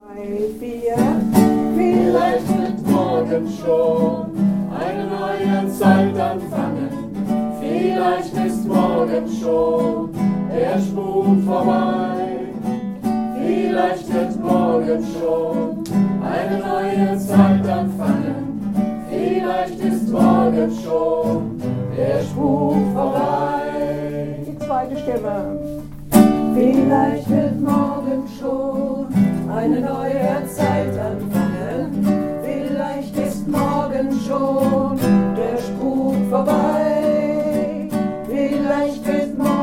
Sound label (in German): Refrain Einzelstimmen; Edelweisspiraten; Liederwerkstatt